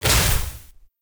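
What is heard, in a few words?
body explode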